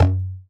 Dance Drums; Best Service ProSamples vol.15; AKAI

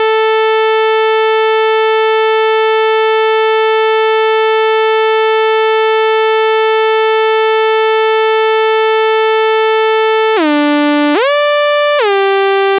Synth Melody, Cinematic Funk; Sonic Foundry (Sony Creative Software); Cinematic Funk Urban Tone Poems

